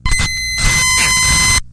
tilted cartridge glitch

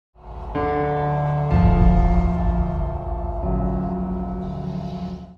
piano; fallout new vegas

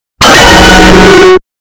bass boost; duolingo

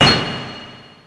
target break; ssb

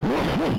cough; garcello

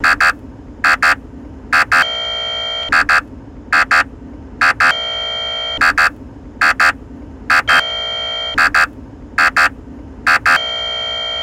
horn

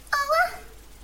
owoooooooooooo

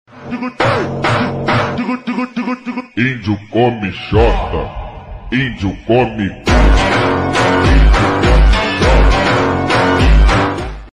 phonk

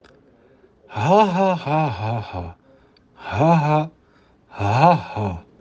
haha

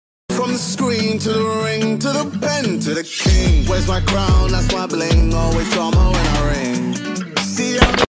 trash song

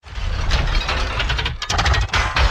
metal moving

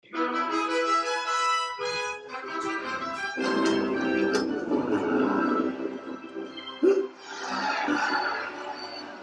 trumpet; toad

